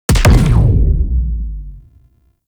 Punch, Weapons; Warframe; Corpus; Tenet Quanta

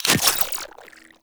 Glyph Consumable Activate; Warframe

